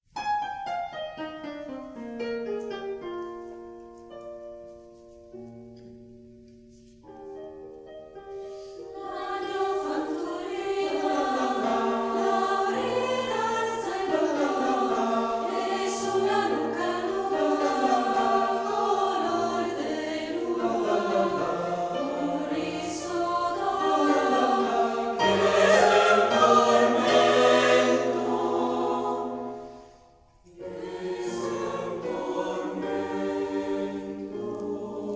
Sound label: Gno Fantulina La, mixed Choir